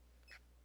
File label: Squeak Churr, rat